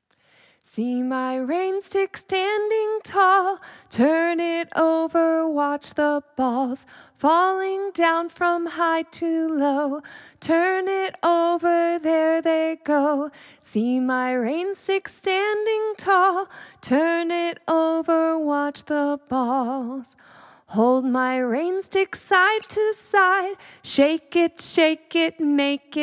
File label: rainstick; see my rainstick